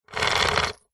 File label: industrial chain small pull over thin wood hoist medieval very short fast